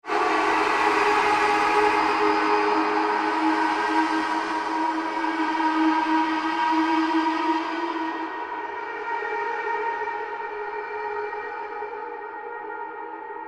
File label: Created Pulse Fade, Rise And Dive Sound Effects; Sound effects created by manipulating other sounds; Rises and Dives